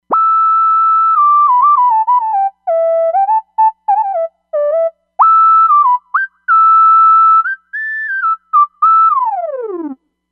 R&B Tri Lead

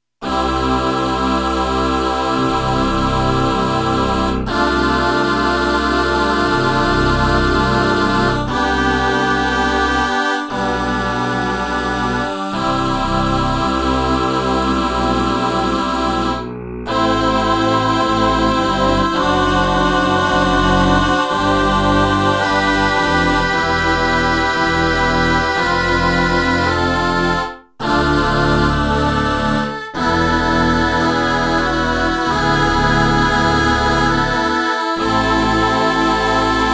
Ah Voices

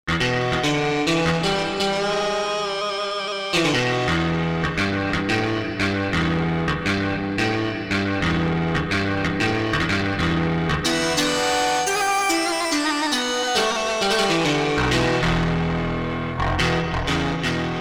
Lead Guitr; Korg MSC-1S Variety

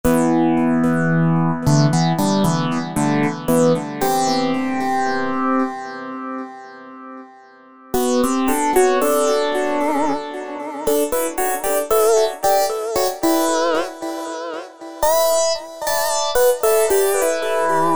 SYNTH LEADS; Moonbeam; Apple GarageBand 2

